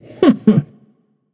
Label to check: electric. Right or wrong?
Wrong